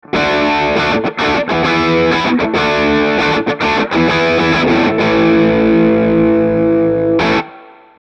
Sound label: Guitar Amp Beyer 201 Neve 1073 Hi Z